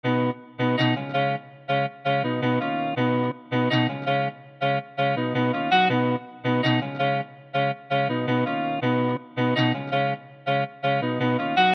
bpm164

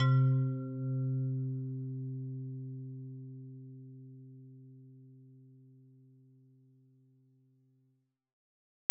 Keys Slow Grind; RB Flava; rnbflavampcexpansion

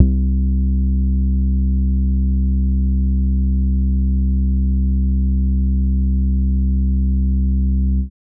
Bass; RB Flava; rnb flava; MPC expansion

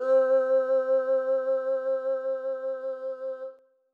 Brass; mpc expansion

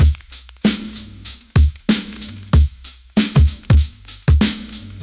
Streetlight Rhythm bpm96, drum breaks; concrete knock drum breaks vol

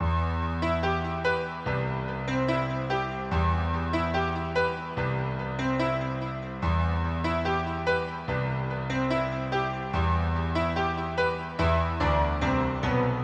Piano bpm145; urban keyz v1

